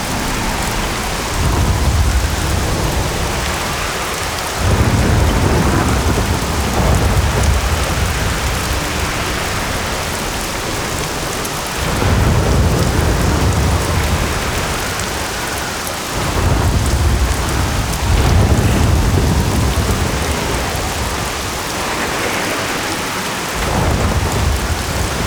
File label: Sea Lab Spawn Storm Loop; Warframe; The New War; Exterior